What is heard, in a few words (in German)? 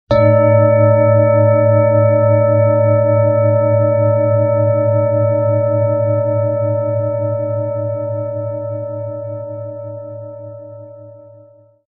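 Planetenschale Neptun, Ø 25 cm, 1600-1700 Gramm inkl. Klöppel; Sound-Spirit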